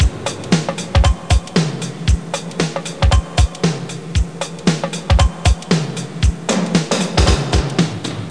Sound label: jump